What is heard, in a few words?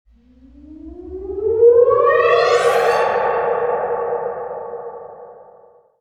Feeling Guilty Short, Tention Makers; RoosterFX